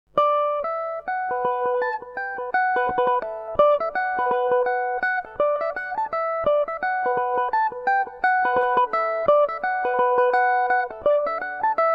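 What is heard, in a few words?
B minor revelation, Irish mandolin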